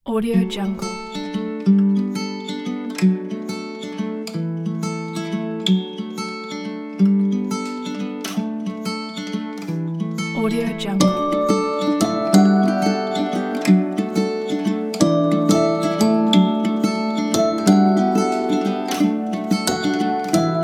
acoustic

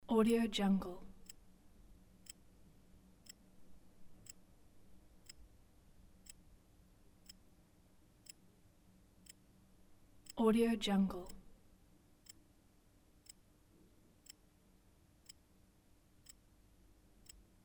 watch ticking

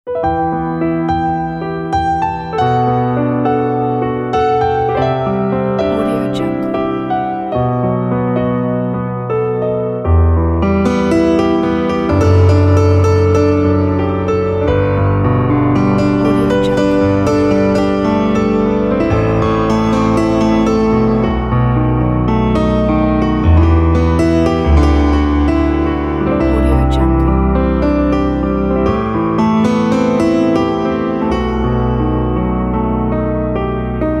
romantic piano intro

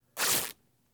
cloth rip linen

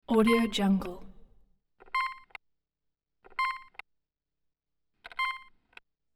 computer key press and beeps; hollywoodedge

